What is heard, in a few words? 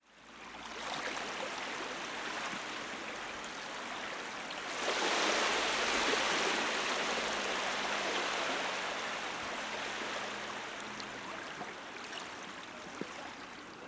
Port